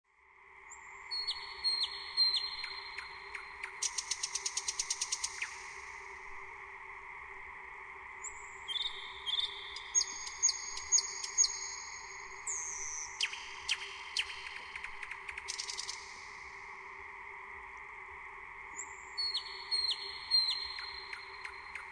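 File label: Nature sounds eastern nightingale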